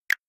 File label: button; halloween catcher2